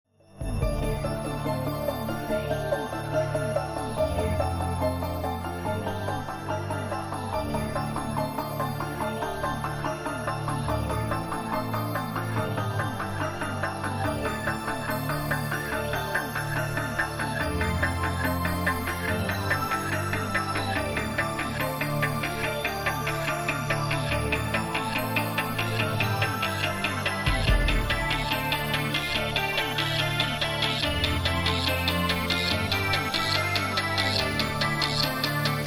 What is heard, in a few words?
Tubular bells